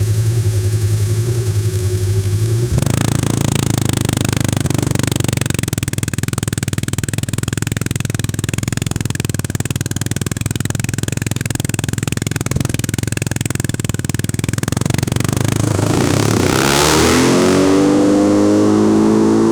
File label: a motorbike accelerating